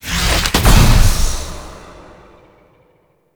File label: Grimoire Alt Fire; Warframe; Tenno